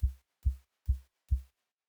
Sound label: grass step